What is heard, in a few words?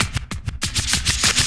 SCRAT; E-Lab; X Static Goldmine 2; akai